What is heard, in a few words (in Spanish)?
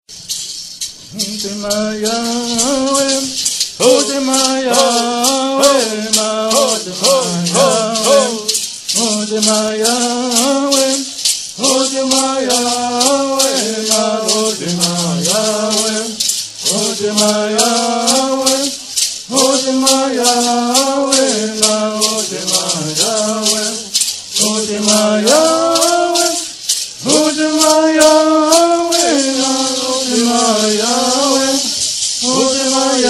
cucapa canto de madrugada